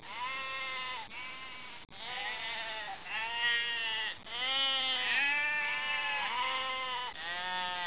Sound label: sheep; 12000 Graphics Pack - A Complete Graphics Collection For Your PC